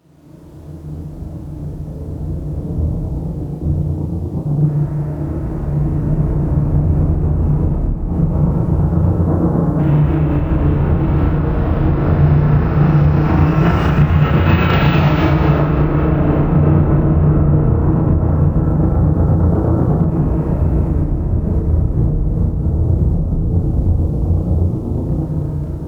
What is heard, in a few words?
Horror Thunder; SFX; Ambient - General